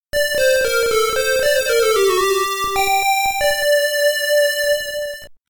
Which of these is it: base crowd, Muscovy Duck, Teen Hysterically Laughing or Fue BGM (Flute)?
Fue BGM (Flute)